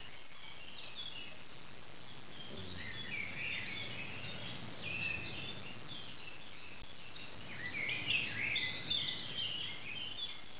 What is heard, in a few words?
Forest